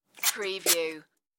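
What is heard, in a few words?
Hair Spray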